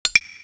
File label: Camera tone; Samsung; GT-C3530; Camera sounds